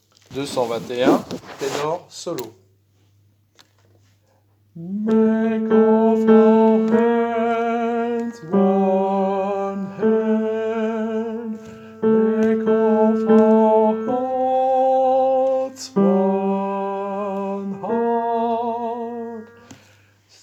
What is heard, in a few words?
tenor